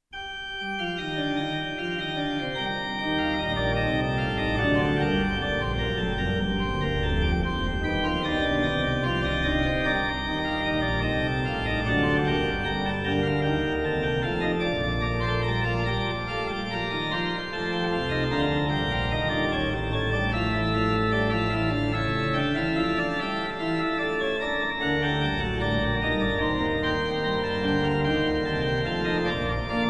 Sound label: ORGAN; Wir Christenleut; Bach; Bach Organ Works; Choral Preludes